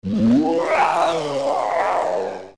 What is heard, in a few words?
Phantom Ganon Die; OOT